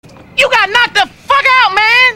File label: Knocked Out